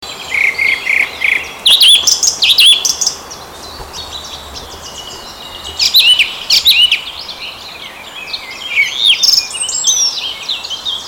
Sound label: turdus philomelos, vogel; hecke; biologie